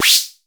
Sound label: CYMB ELEC; Crypton - DrumBase5000